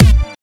trebly bass drum sound a sharp key, kick drum single shot tuned to the A# note